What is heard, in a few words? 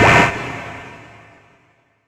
SHORT, SHORTNOIS